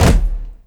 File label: Kick Crash